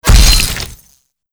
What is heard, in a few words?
critical hits